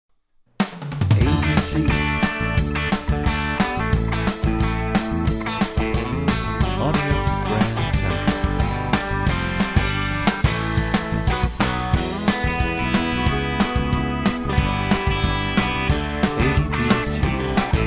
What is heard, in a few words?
American Country, radio jingles; Audio Brand Central